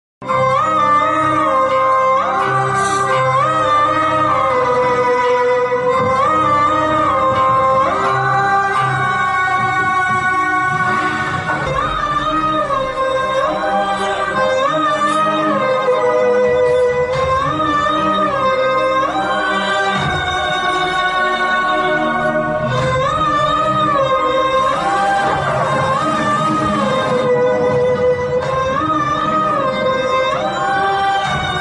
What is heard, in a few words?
feel sad bgm ringtone tamil feel ringtone; pushpa amma